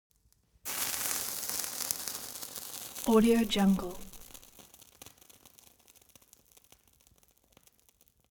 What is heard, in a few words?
elements fire torch sizzle long